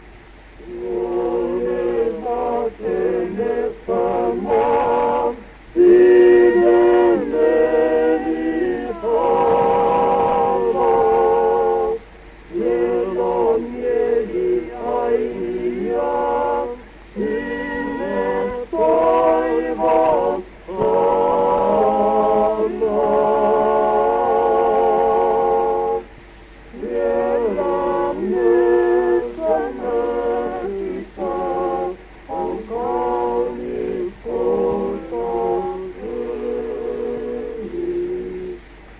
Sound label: Gramophone